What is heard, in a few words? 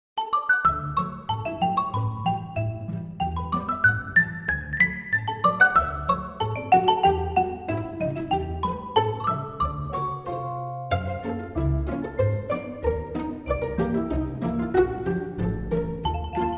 xylophone; pianoteq8